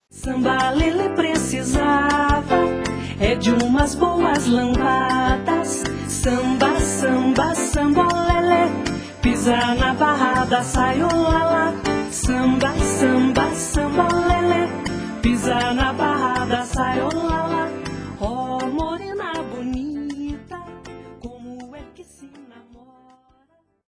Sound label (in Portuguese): samba lele